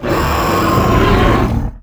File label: pinky sight; DoomRTX